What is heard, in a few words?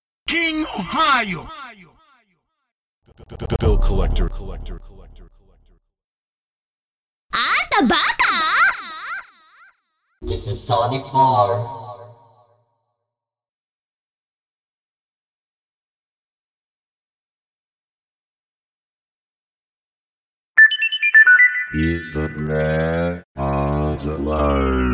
beat tags